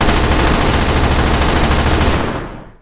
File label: gunfire